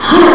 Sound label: jump; quake2; male